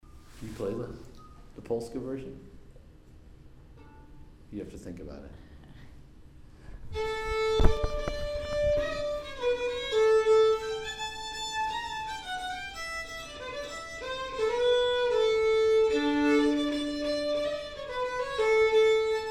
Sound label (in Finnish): polska; Sormi polska